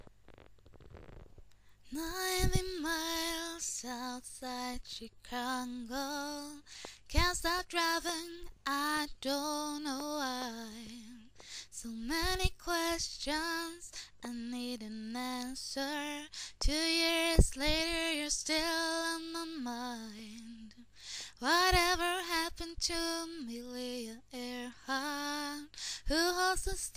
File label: sings